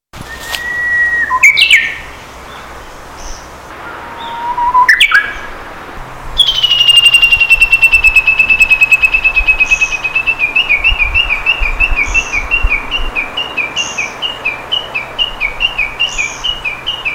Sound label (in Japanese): uguisu